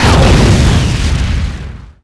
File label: Small Exp, Explosions